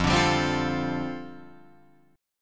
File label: F 6th Add 9th F down Guitar Drop D, chord